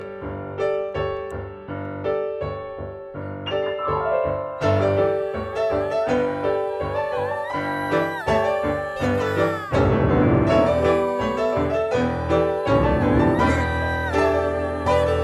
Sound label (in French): Tenor